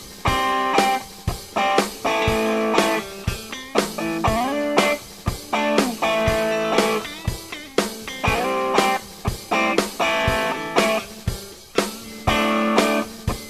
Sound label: boost